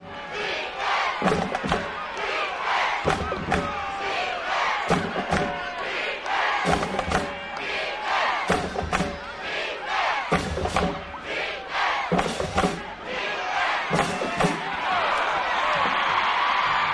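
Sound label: football chant, chants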